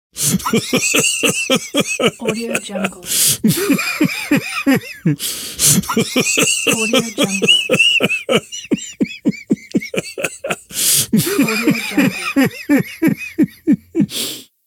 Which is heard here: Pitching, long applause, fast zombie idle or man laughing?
man laughing